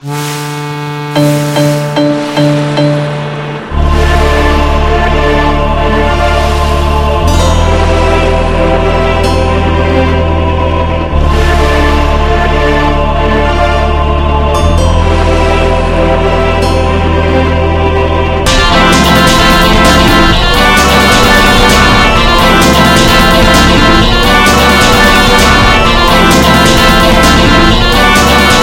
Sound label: Lullaby